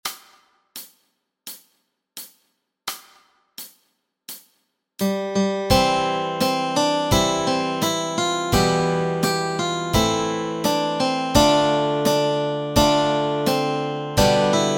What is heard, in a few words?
Simple Gifts melody and chords